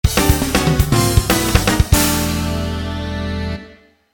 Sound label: jingle shippai; VirtuaTennis